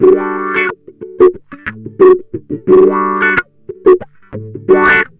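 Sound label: wah funk; Jazzistic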